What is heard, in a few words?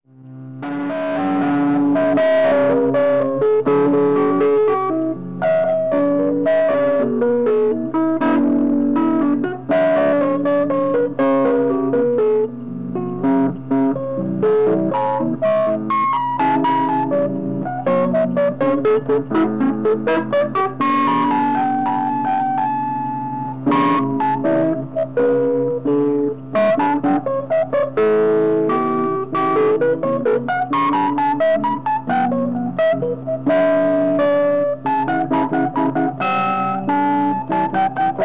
piano